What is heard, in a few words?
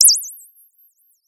Sound of a bird tweeting nicely